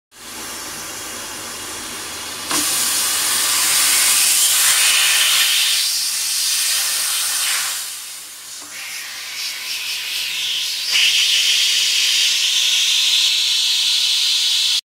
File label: Fidget spinner meets a vacuum cleaner